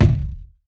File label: Deep Box, household rhythm samples Hits